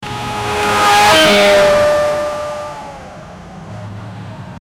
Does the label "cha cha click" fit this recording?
No